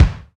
DMA XD-5, DIGITAL; 90 s Sample CDs; Sound & Vision - Gigapack I CD 1 (Roland)

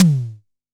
Tom, Vel High, Tama TS-206

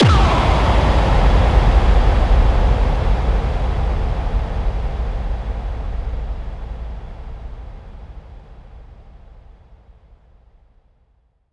FX Kicks

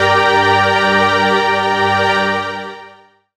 Orchestra, Pads; Jungle Warfare 1&2

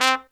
T C Q, TRUMPET FX; Zero-G; Phantom Horns

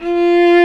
Vla F3; Digital Sound Factory Virtuoso

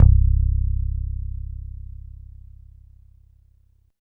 BASS C2; East West - Phat & Phunky

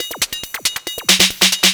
drum 138, drum-loops, 2bass, Techno

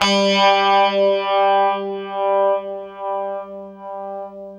UNIVIB G, Guitar; East West; Steve Stevens Guitar Samples Collection

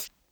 KIT, Dance Mega Drums, HIP

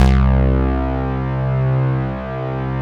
OB BASIC

